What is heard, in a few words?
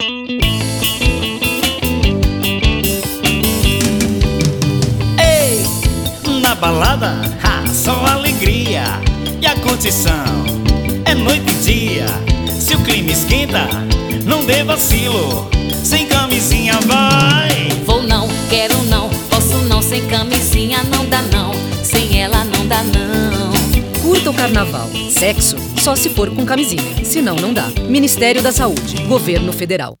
jingle; vou nao fase 1